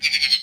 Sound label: Spider Hit Sound; enemies